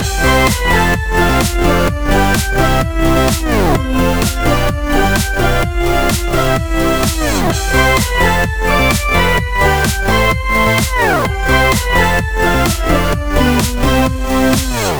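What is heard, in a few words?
background music skill; dj cookie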